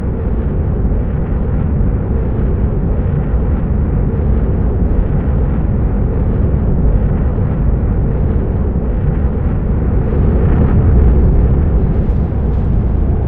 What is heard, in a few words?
balcony